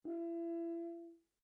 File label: HORN E5 MP LONG STOPPED, French Horn in F; Alexander French Horn in F; Sound Effects:Musical; Foundation3